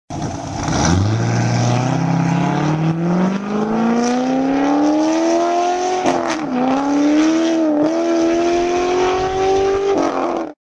corvette engine

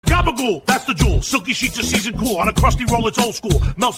rap

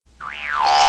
boing reversed

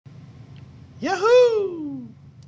yahooooooooo

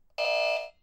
ticketer reject

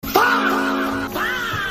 faaaahh

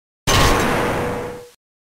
reflex hammer hit

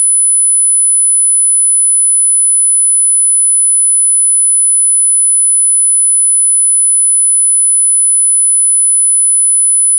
10khz

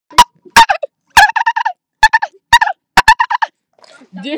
dolphin voice

